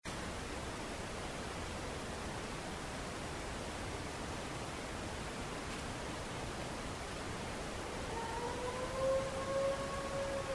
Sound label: Coyotes; Coyotes (Canis latrans)